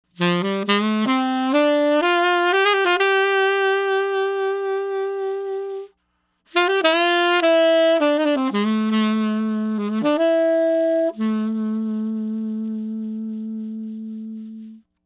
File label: selmer tenor m6